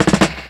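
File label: snare roll, Ragga & Dub Reggae; USB Soundscan vol.27; AKAI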